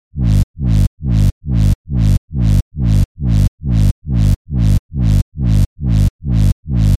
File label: Reverse Bass Loop G (138 BPM)